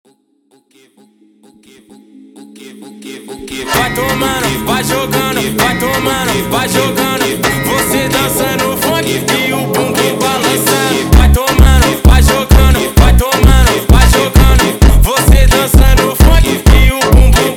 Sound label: Distorted Funk